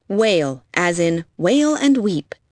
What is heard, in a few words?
wail